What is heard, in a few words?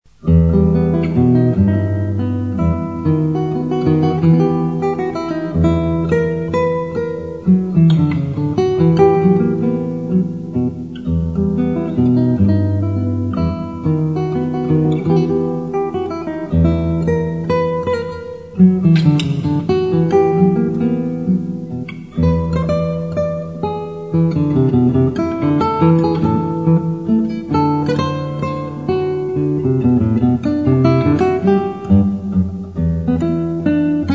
menuett